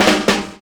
FILLS, 70_s Breakbeats

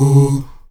AH OO C#3, STACC. OOS, Africa; Spectrasonics; Heart of Africa 1

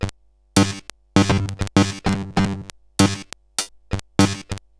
Bent RX17 (100BPM), cyborg beats; musicradar

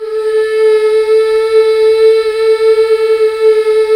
MMHH, BACK. MMMHH Fem

